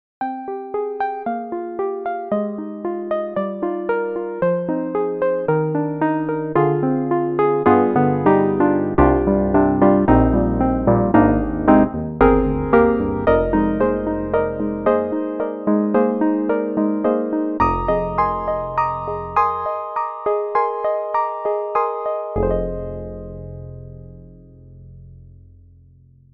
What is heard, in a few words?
FM Mellow Grand; Steinberg HALion 7